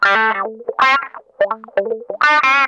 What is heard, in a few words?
TIMER, Funk Guitar; Zero G - Funk Guitar